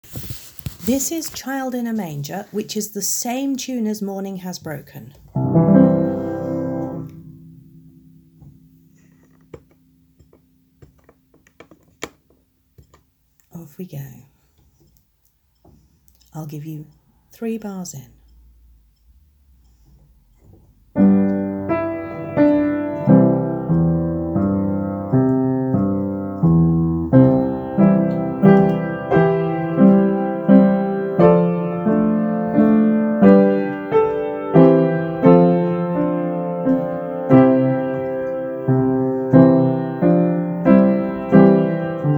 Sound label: child in a manger, cello